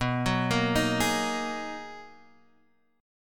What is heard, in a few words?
B Major 13th B down Guitar Drop D